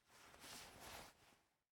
Player Move Stay; MiSide

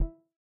Click Case; Game rip; MiSide

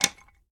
Tile Use; Game rip; MiSide

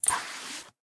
Take Food; Game rip; MiSide

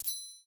Money; MiSide

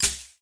Ship Bullet Destroy; Game rip; MiSide

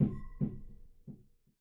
Sub Tube Hit; Game rip; MiSide

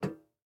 Step Iron; MiSide

